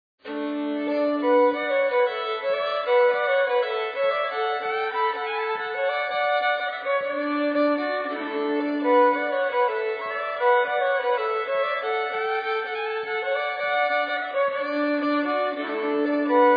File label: Fiddle; Prillaren Fra Os Prestegjeld; grieg